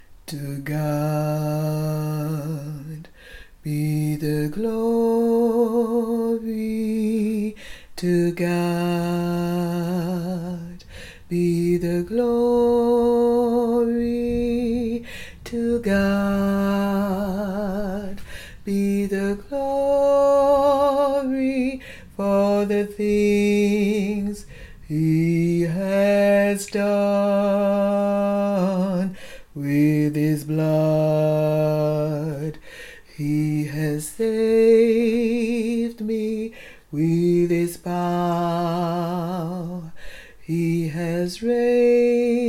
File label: TENOR